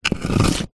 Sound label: flower pot heavy clay slide drag hard ground; household